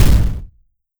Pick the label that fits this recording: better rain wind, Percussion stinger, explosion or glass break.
explosion